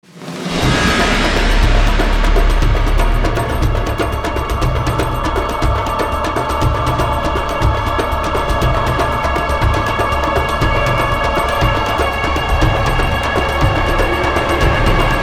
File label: Drums Only Version